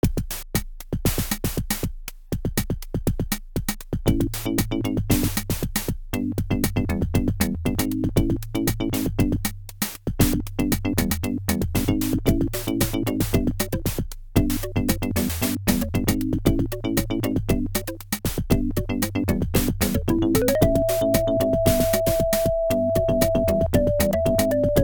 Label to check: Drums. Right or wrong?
Right